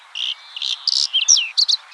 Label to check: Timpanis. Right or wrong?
Wrong